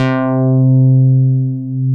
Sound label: MOOG1 BASS C3